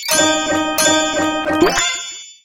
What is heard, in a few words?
Ui Levelup Newstar; Superb; Nickelodeon Super Brawl Universe; Android